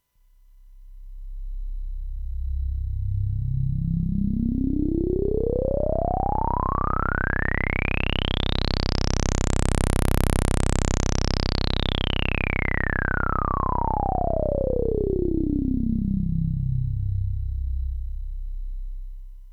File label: solaris vs andromeda moog filter env 10 sec ad